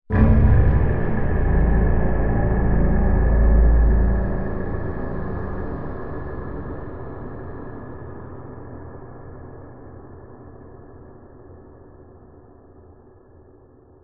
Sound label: horror stab dark scary cinematic